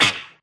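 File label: impact bullet generic titan shield; Titanfall 2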